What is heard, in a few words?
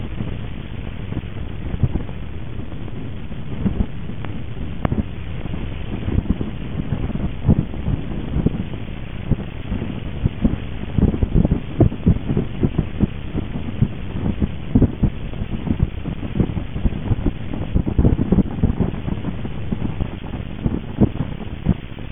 treetop compressed, light to sound